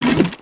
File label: Basic Melee; creatures organic; Skullken; aronwk collection; ndaudio